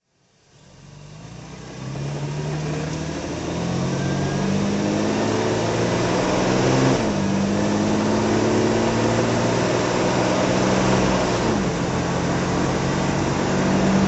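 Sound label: jaguar mkII 38aut drive, Engine sound driving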